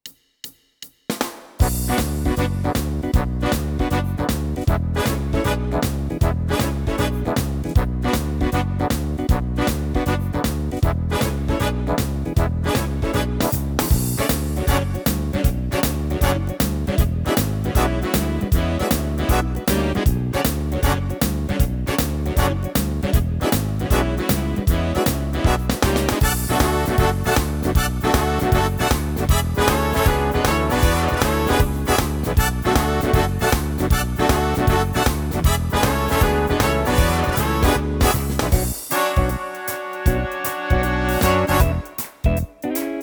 style swing and jazz mod big band shfl, yamaha tyros3